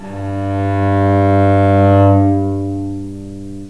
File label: G string arco, cello